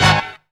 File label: MASTER HIT; Zero-G; Funky Elements